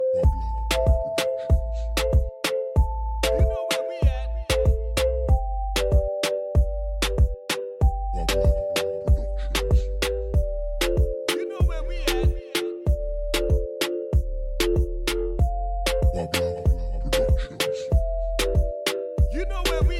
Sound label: Latin Reggaeton